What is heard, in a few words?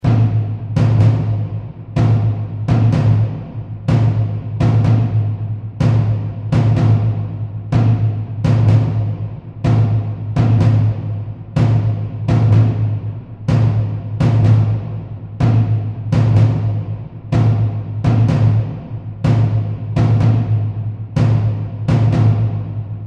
tension Loop; Dynamite Digger Eureka